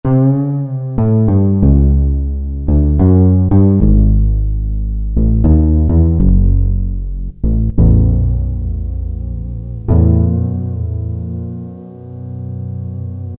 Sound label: Fretless Bass, Roland PN-D50; Roland PN-D50-02